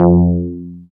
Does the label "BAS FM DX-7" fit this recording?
Yes